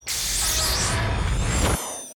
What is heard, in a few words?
air lock big hissy suck metal ronk spaceship